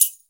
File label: TAMB